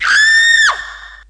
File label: SCREAM